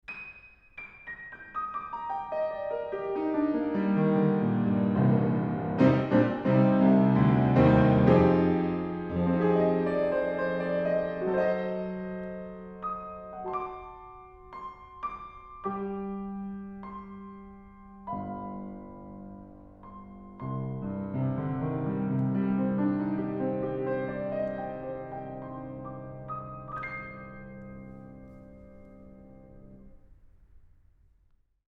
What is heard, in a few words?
Piano, Horiz Half Open Mic